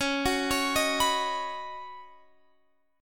C sharp 7th Suspended 2nd Suspended 4th, C sharp, down; arpeggiated